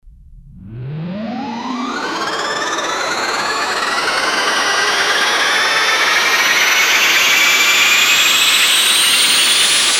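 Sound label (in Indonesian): buat efek suara mengangkat, armor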